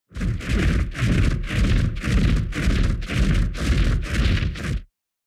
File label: March